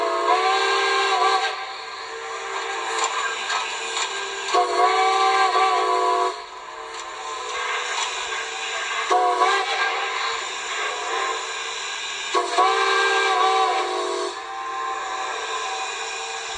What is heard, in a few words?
Steam Whistle; lumber larry